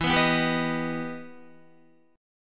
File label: E Minor Major 7th, Guitar; 11,14,11,12,11,11; 4F,4A,5C,5G,5C,6E; strummed; JGuitar